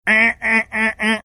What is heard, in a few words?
imitating sound alarm clock going off